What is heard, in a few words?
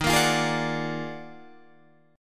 D sharp Minor Major 11th, Guitar, Drop D